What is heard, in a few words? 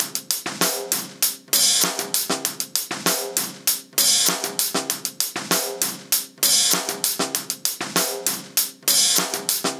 98 live funky hat drum